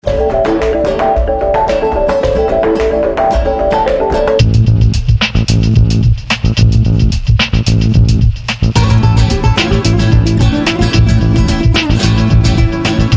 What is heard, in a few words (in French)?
jingle espagnol